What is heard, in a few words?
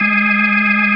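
B3 G#3, organ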